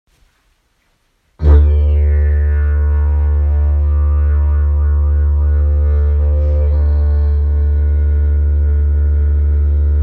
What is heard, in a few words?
didgeridoo; jilm velky bratr